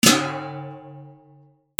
KITCHEN

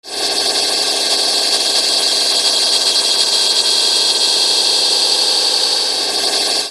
SCIFI

